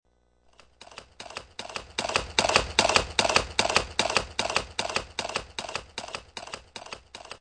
Horse Galloping By, Horse galloping past the listener.; Animals